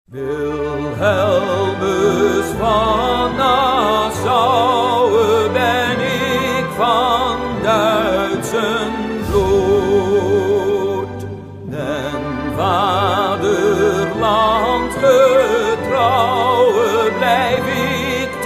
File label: National Anthem of the Netherlands; Wilhelmus